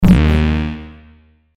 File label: Guitar Gun Shot; Guitar Two